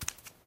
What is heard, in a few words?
bare step; Golden Crayon Sounds